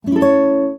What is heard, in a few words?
Classic Guitar, notifications